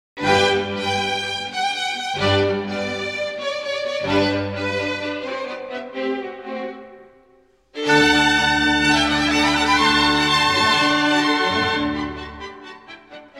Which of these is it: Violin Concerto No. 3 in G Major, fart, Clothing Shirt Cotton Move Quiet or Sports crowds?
Violin Concerto No. 3 in G Major